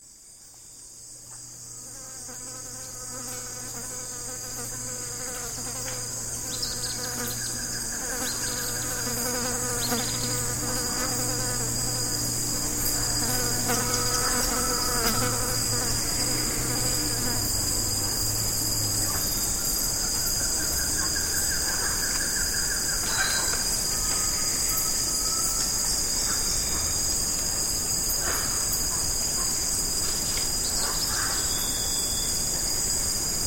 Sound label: Chachalacas and Macaws